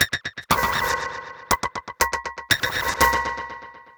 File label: Modular Noise Rhythm (120BPM)